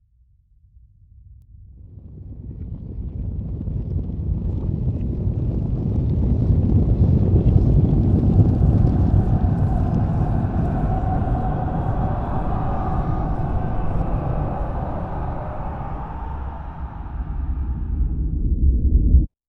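uzhasayushij krik s effektami, terrifying scream with effects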